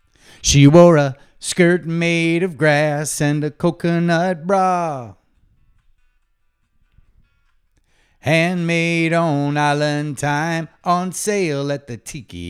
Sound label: vocals